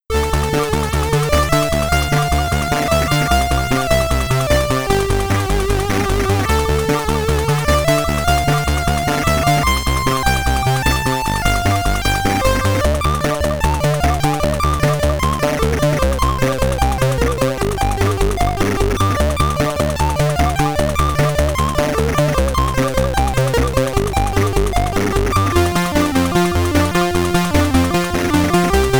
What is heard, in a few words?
SID Stereo, commodore 64; Las Vegas Casino; Baccarat